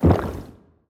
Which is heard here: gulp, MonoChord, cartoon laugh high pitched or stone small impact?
gulp